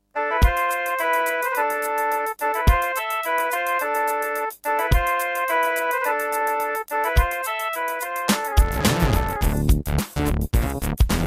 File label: Aztech CS9233 DB; Lost Vikings